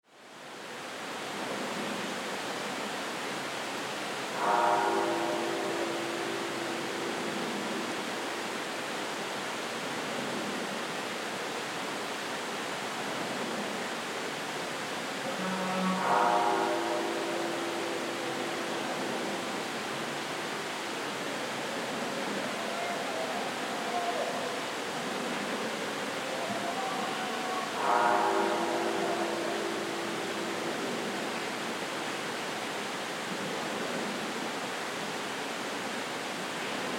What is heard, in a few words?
rings in the forest; alishan